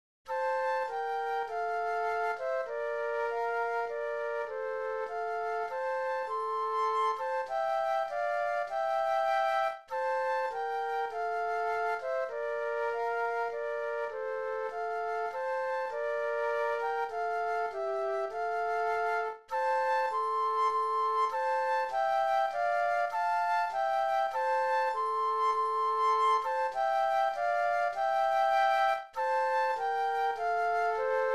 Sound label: Flute Duet